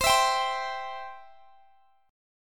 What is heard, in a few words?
C Major C down Guitar Drop D